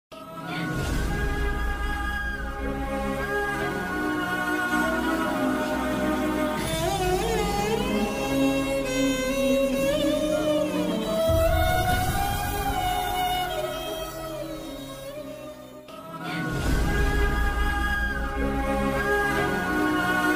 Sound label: Sad Bgm